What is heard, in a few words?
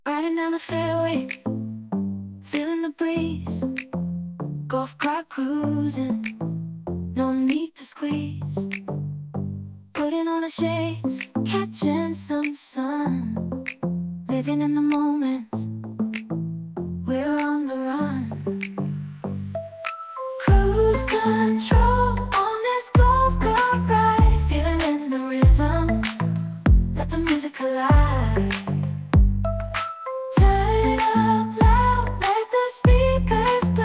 music